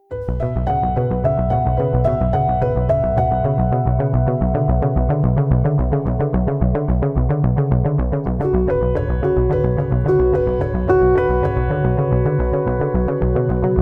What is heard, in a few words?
Analog Pluck